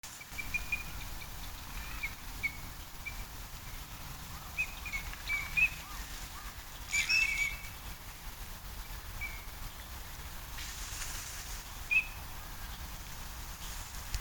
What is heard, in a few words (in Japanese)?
kogamo, bird song; コガモ; 鳴き声; 新潟県新潟市中央区 鳥屋野潟公園; J-eco 鳥のさえずり図鑑